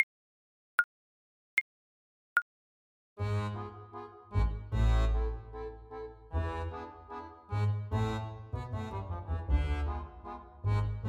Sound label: el choclo, clarinet